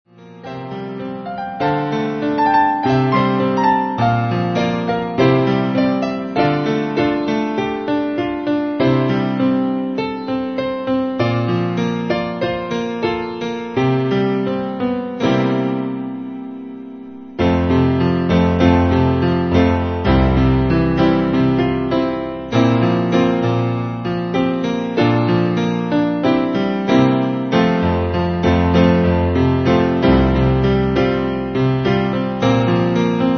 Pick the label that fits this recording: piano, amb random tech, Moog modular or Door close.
piano